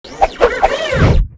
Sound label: ATTACK